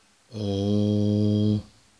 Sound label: Vowel Sounds A